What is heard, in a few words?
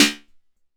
Snare Delay, TR-606 Bent Samples; Sonic Foundry (Sony Creative Software); The Electronic Music Manuscript Collection; Battery 3 Sample Kits